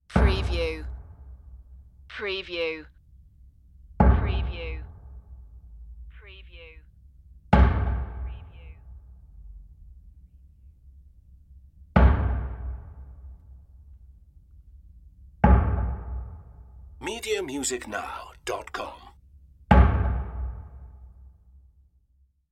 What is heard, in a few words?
dark impacts